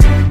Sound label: Hit